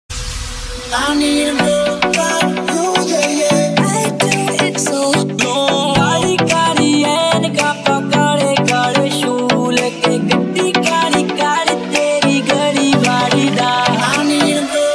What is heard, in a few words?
mashup ringtone